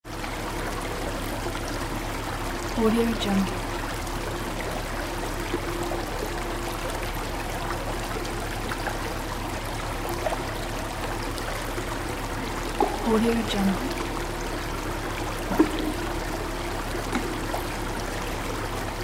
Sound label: jacuzzi hot tub